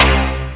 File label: Spark-Bass